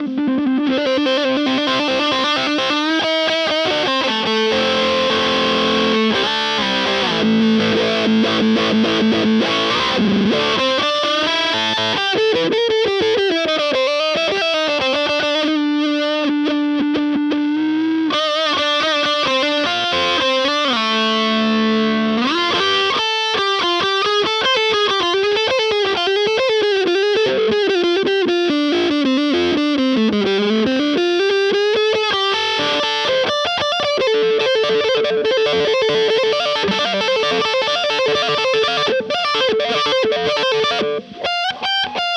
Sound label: Morley Wah Lock